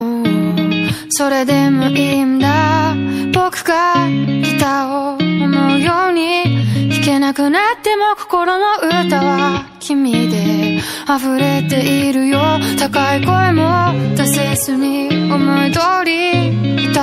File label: japanese ringtone